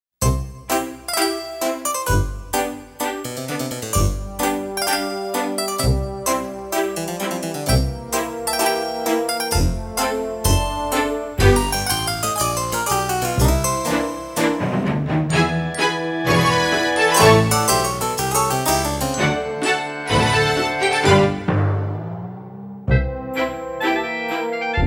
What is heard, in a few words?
Comedy Style; Sound Ideas - Comedy Styles